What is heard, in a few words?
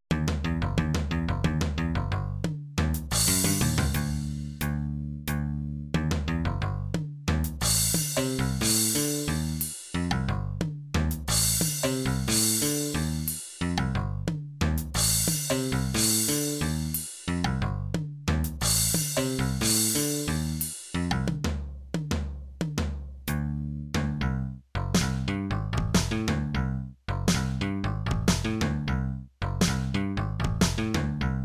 bass drums